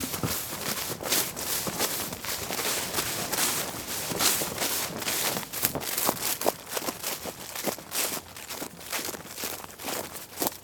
Running Through Tall Grass

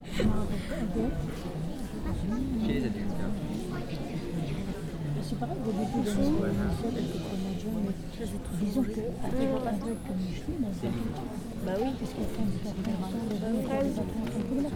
Audience Before A Play

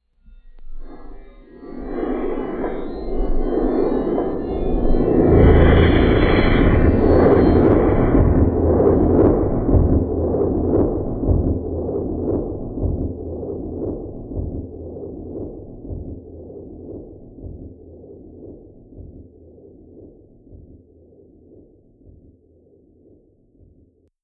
Interdimensional Train Pass

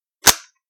Handgun Dryfire

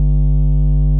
Buzz

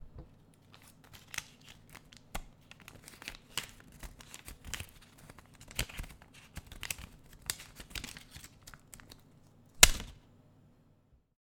Counting Money

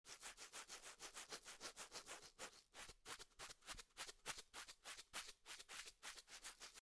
Brush

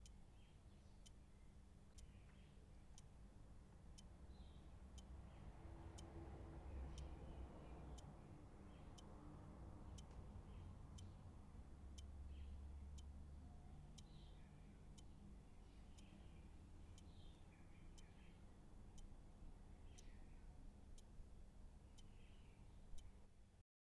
Time Passing By

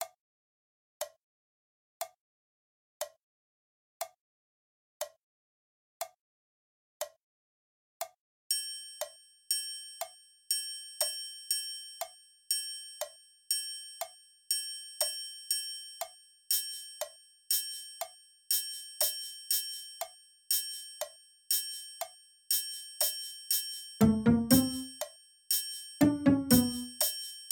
60bpm Comedy Background Noise Music

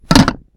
Impact

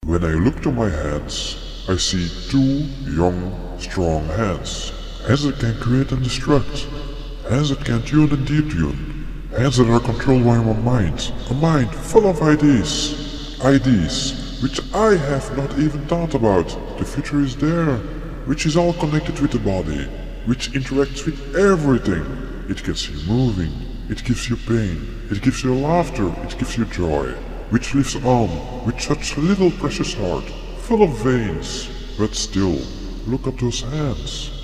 Acapella

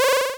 Menu Select

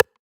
Rim; Boda

